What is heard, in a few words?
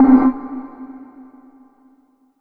Korg M3R Tecno Block C4